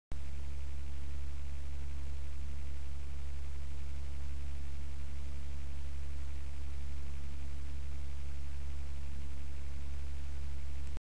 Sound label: Noiseblocker eLoop B12-2 7V